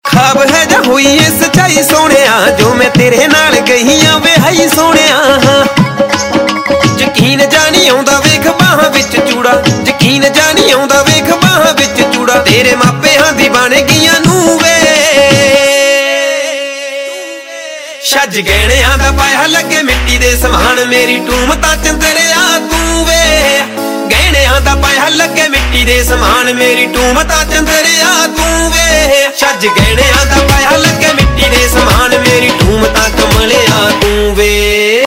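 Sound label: Ringtone, Punjabi Pop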